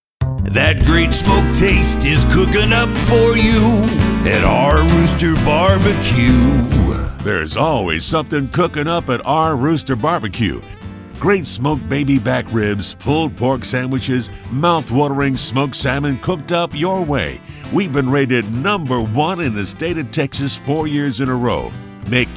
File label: ROOSTER BARBECUE, jingle; jingle samples